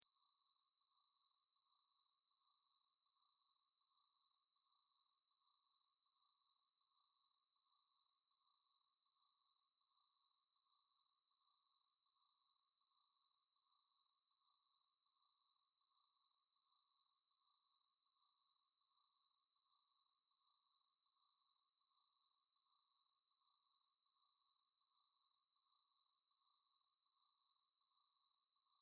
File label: Crickets Chirp, Crickets, Chirp, Ambience, Night, Insect, Bug; Blastwave; Animals:Insects